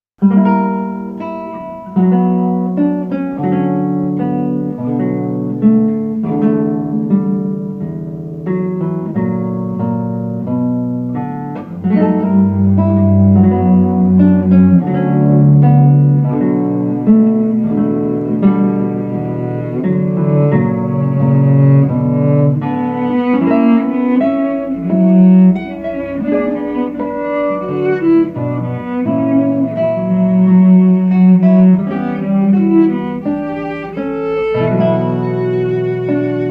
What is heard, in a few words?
lute tuning